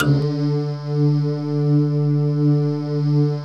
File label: ENSONIQ VFX HYBRID; Rubber Chicken Software Sample Bank